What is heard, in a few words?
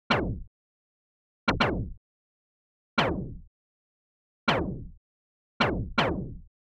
Laser Gun